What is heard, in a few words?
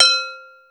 Sm Bell, Yamaha Motif ES drum machine; 300 Drum Machines; Yamaha Motif ES-Series